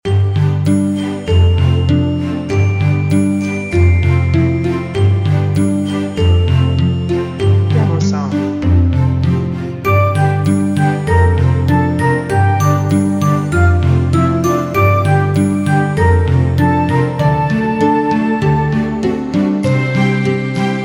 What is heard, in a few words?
Tricky Adventure